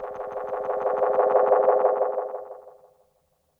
Flyby Hi; Lost Tapes From Mars

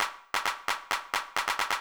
Beat, Old Skool House, 132bpm; Big Fish Audio; Marshmellow; Construction Kits

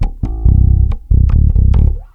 bass loop, Ragga & Dub Reggae; USB Soundscan vol.27

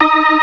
organ, all stops; Keith Emerson Signature Series

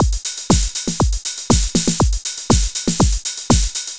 LOOP120; Sounds Good - Eurotech

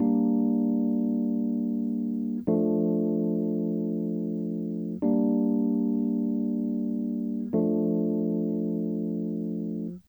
PNE, Hip Hop and R&B; Best Service ProSamples vol.02; AKAI

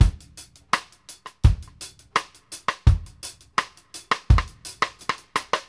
1+3 STICK, REGGAE 84; Sampleheads; New York City Drumworks VOL-1; KD REGGAE 84

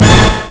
orchestra true; Amiga Soundtracker Sample Packs

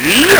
LSDj, Nintendo Gameboy; 300 Drum Machines

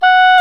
oboe, Orchestral Winds

